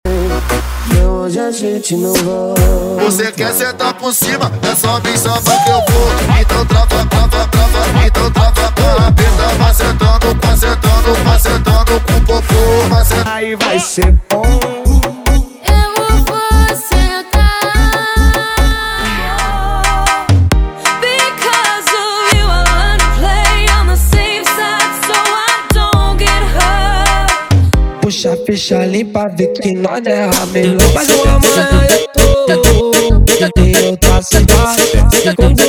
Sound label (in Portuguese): Funk Light